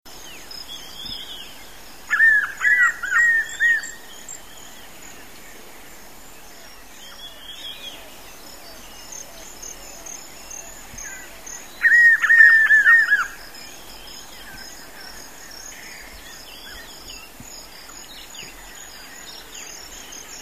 Spot-backed Puffbird; S. America

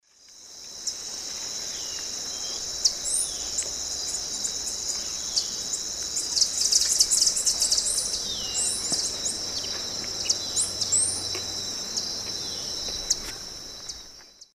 Tangara chilensis, calls and flight calls group; S. America; Eastern Lowlands

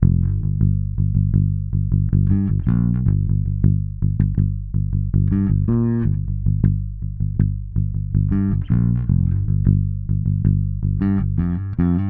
Bass Hip-Hop

